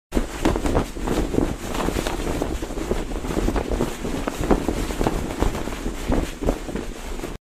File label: cape flapping; superheroes